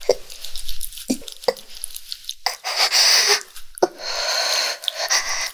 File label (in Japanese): 個別絶頂